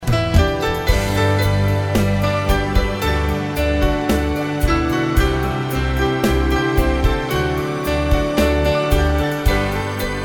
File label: Piano; kolkata